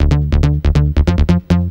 bazzz; Techno Music Maker; Bass

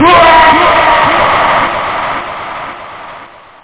Player death; TANX Preview

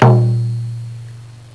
INDIAN L, PERC

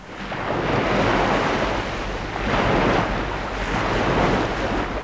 waterlap; Wonder's Greatest Toyshop on Earth

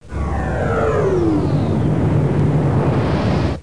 drop; dropimp2

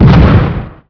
Expl smal; DeepFighter; PC PowerPlay 55

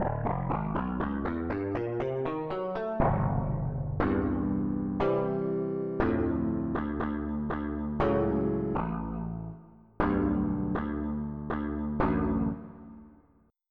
Modern Gtr Dark

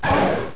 LIZ BITE; landslor

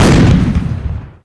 big gun; Falcon 40

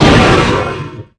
sub gren hit

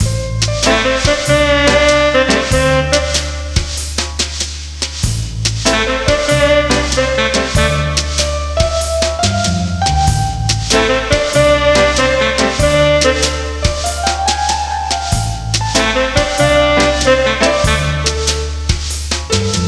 no jazz

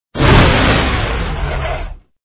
finish Sound

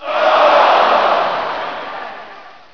FALL; mssoccer; socdemo

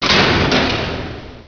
gate stop, doors; Hexen2

